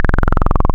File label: Saw, wavetables; Animoog